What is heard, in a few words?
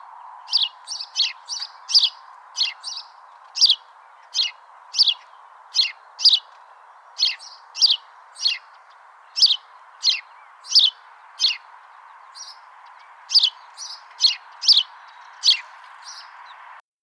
sparrow; birds in the bible